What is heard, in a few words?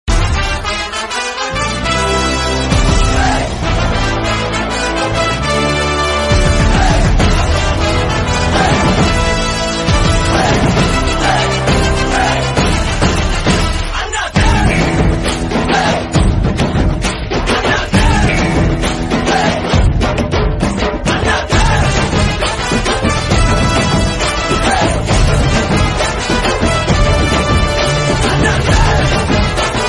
Instrumental Music; Annaatthe